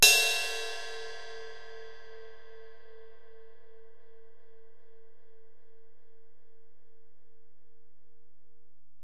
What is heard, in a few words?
Ride, Alesis DM-PRO, Drum Machines